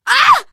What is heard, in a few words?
hurt critical, teen girl, survivor voice; Left 4 Dead; Source Engine